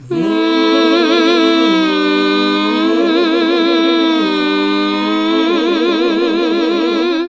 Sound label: HAL GOSP., GOSP. MMMhh; Sound & Vision - Gigapack II CD 1 (Roland)